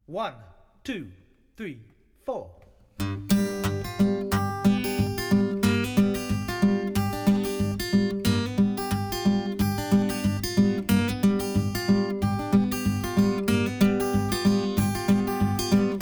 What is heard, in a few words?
Guitar Playback